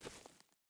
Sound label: footstep burlap gravel walk; Rust